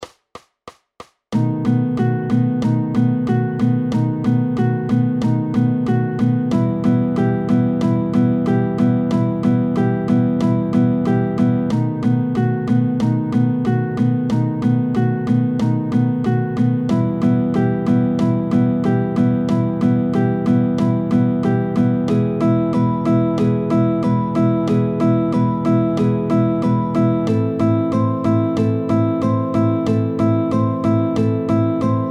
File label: tango 2 guitars Backing Track; The SongSmith